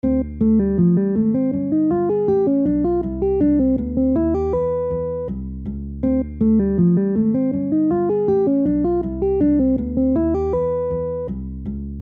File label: major 251